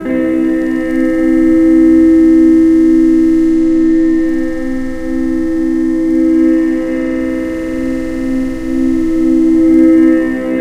FEEDBACK guitar; Big Beat Samples & More; AKAI

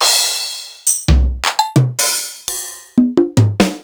Korg KR-Mini, Samples Sliced; m8

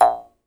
jaw harp, Pitched Percussn, Jaw Harps

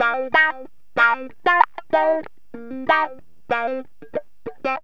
GTR, CHANK; Zero-G; Funky Elements

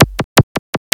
ELEC LOOP, ELECTRO K, Ragga & Dub Reggae; USB Soundscan vol.27; AKAI

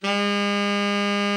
TENOR, Killer Horns, SECTION LOOP; Best Service

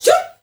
FEM GRP, FEM NOISE; Spectrasonics; Heart of Africa

